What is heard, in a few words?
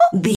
voice fun small character emote surprised